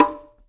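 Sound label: bongo; Spectrasonics; Liquid Grooves 1 & 2; CONGAS+BGOS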